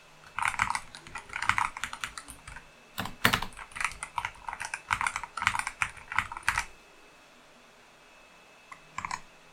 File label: Logitech Pro X TKL Rapid